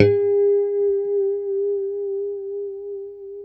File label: A4 pick harmonic, Guitars; Spectrasonics; Hans Zimmer Guitars VOL-1; JC-HARMONICS